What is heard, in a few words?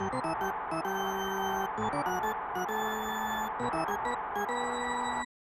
Organ Theme; Super Slap Shot SNES